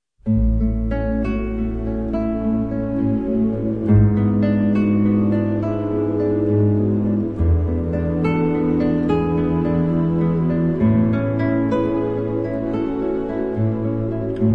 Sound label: Etude No. 3 for guitar (and strings; Academy of St Martin in the Fields